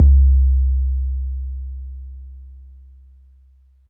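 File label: bass drum 808 MPC60 Smooth C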